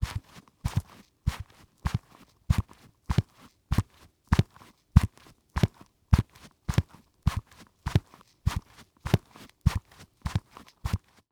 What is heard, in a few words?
Foley Footstep High Heels Rug Carpet Walking; Foley Footsteps